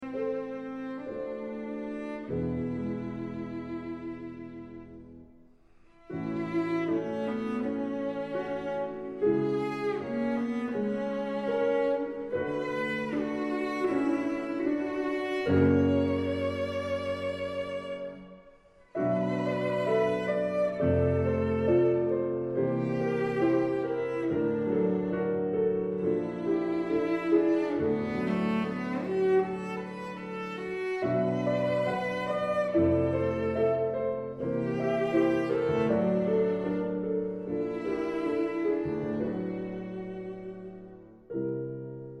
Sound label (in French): Sonate Piano